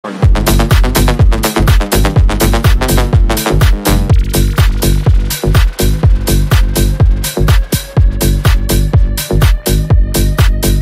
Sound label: Ringtone